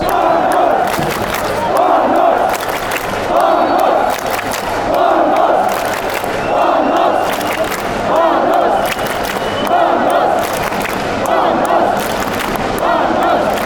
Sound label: clap, chants; randers